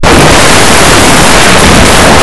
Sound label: drawer; Blue Heat